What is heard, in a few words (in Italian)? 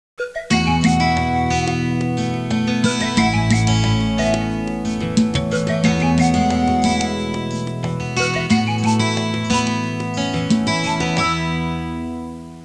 suonerie musica hawaiian